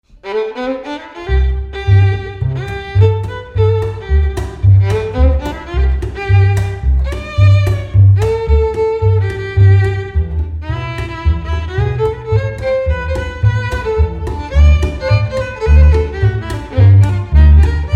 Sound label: String Quartet; Gabriella Strings